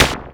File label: Percussive, Drums Perc One Shots